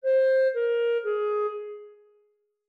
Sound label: 5 notes F min